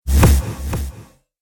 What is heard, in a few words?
Cinematic Strike Single Echo, trailer sound effects